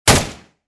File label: assault rifle shot